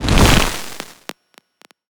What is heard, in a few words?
combat drone weapon scifi particle; Bluezone Corporation